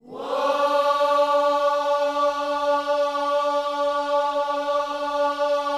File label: WHOA D 4, Vocal Planet Gospel; Spectrasonics; Vocal Planet Vol.1 Gospel; akai